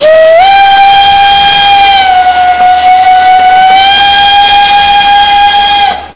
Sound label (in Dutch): Fluit radio